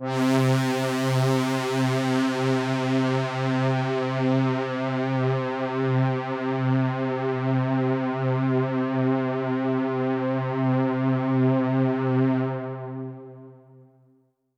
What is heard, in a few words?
Pad C, Synth Prophet One Shots; Electronisounds